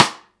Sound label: STICK, Drum 1 Dry; East Collexion